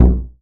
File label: BD, BASS DRUMS; Drum Mashines; BASS DRUMS 2; EdgeSounds